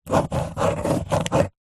office pen ballpoint paper hollow table draw diagram